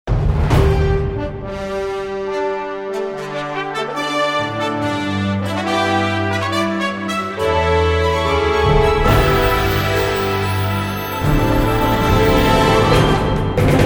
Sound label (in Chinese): 宣传片背景音乐